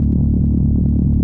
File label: elec; quake2